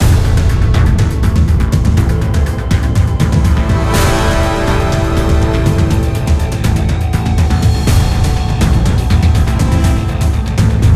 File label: Battle Low Tension; bulletstorm ost; ps3 ps4 switch windows xbox 360 xbox one